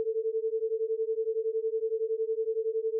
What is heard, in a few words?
Autogenerated sine 120 cents